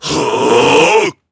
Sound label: monster attack